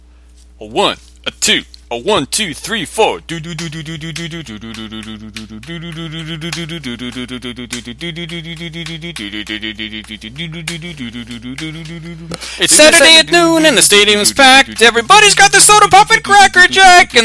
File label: Accordion Bus Demolition Derby